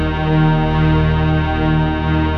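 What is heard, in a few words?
synth strings; Best Service Gigapack 1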